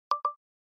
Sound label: ios nfc sound